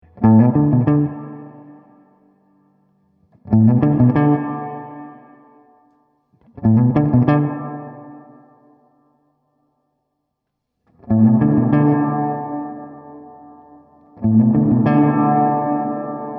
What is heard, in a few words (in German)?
Hall